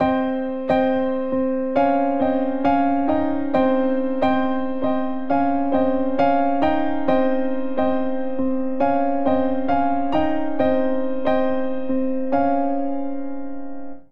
Vamp Phrygian Bass Chords Melody C Minor - Db Major 68 bpm, Grand Piano; MIDI Clips; Ableton; Factory Packs